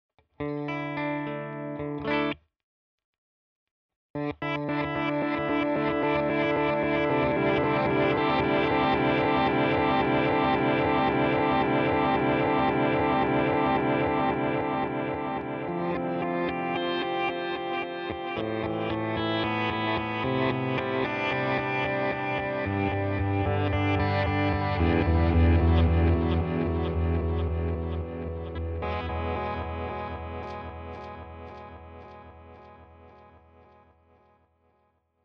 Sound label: Walrus Fundamental DELAY Reverse full